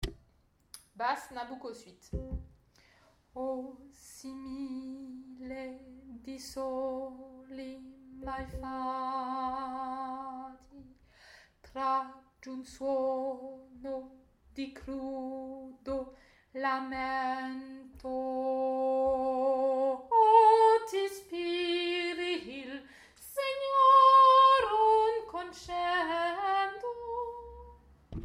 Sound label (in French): basse; nabucco